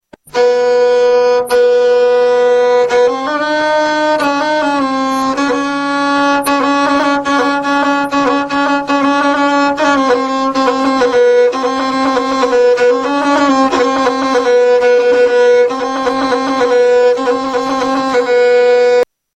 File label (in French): instruments guzla